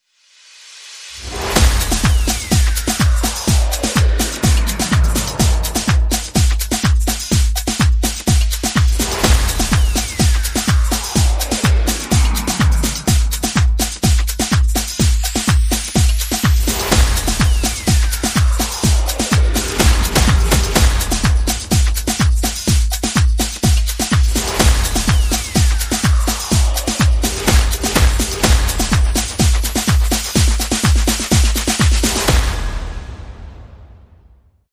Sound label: Go Groove, Percussion and Effects